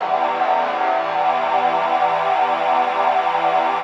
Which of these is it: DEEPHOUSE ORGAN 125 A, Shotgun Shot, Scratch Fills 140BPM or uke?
DEEPHOUSE ORGAN 125 A